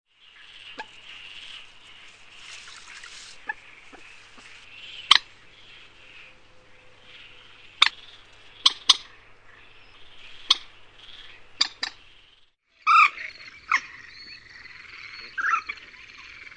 gallineta comun

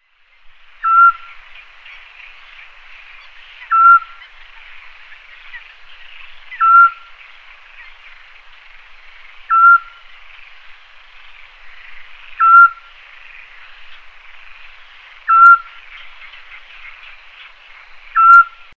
autillo

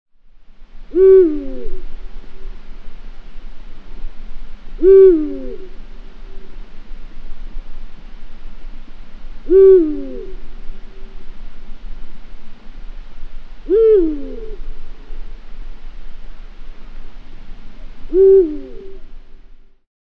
buho real

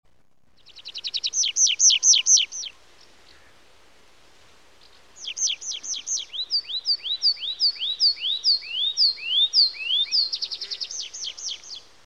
bisbita alpino

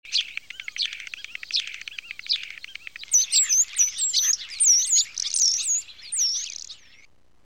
estornino pinto

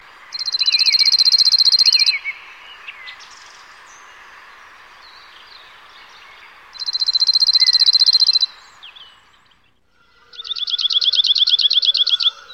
escribano soteno

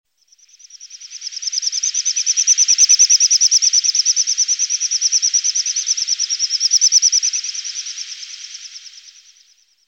sison comun

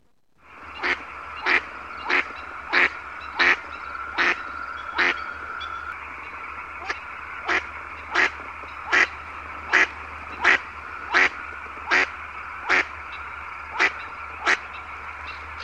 pato colorado

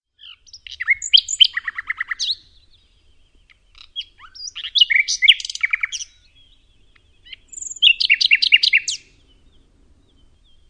ruiseñor

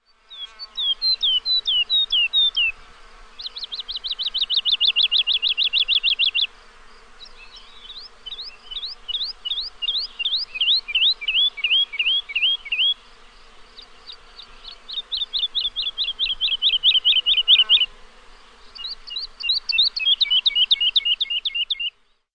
totovia

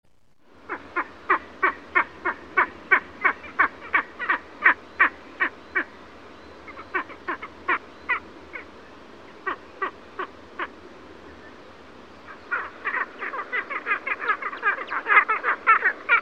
aguila imperial

